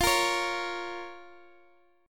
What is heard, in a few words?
F Suspended 4th Sharp 5th F down Guitar DADGAD